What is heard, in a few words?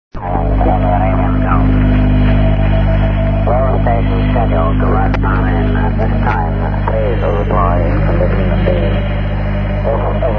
usb; manama; bahrain; rainer brannolte, d